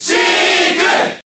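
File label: Cheer, Japanese; Sheik; Brawl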